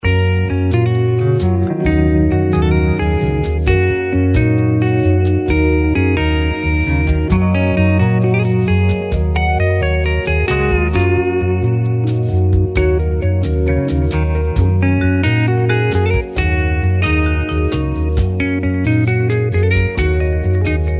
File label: SLIDE AND BRUSHES